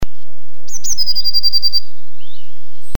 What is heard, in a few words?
parus caeruleus